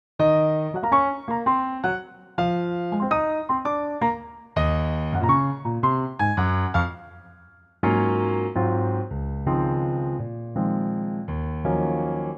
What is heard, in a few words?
Db piano